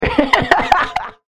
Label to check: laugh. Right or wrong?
Right